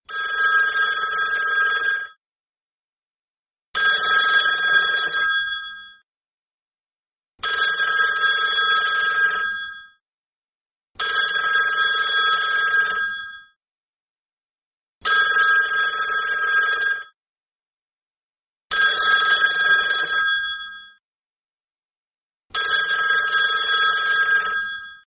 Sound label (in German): HTC Original Klassisch